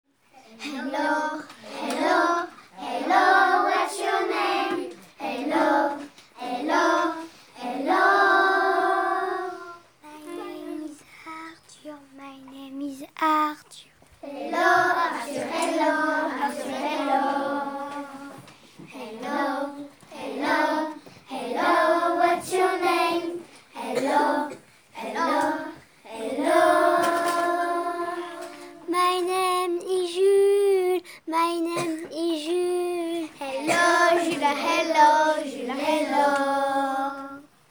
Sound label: Vocal